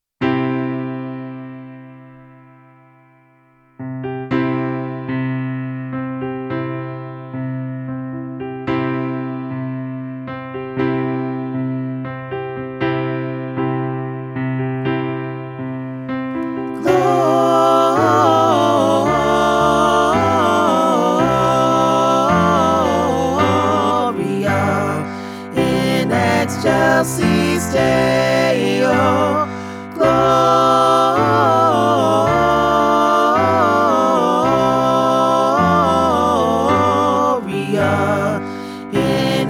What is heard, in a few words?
Full Choir, christmas choir